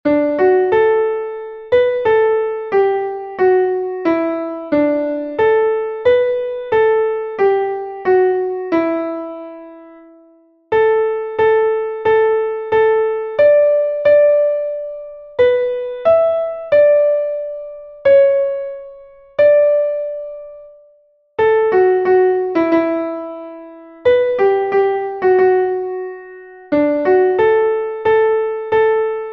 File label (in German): klavier; MelodieMeister